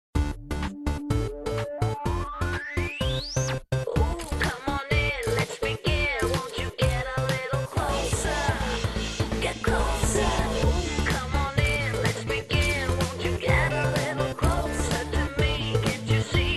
Sony Cyber Shot

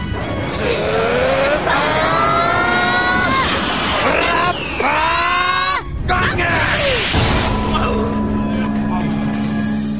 Frapper Gong

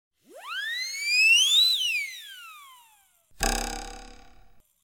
Twang

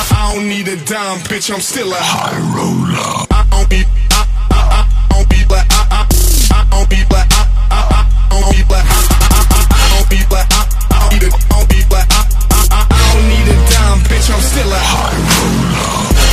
dubstep

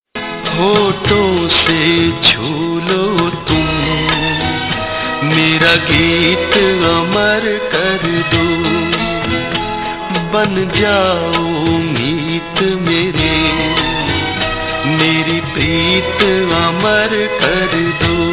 Gazal Tone

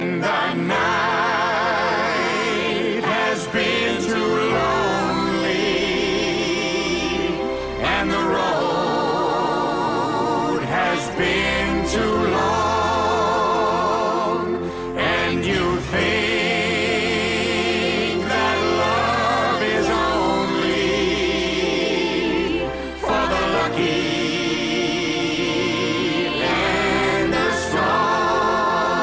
Driving Song

